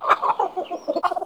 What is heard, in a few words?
Bird Of Prey Vocal Shake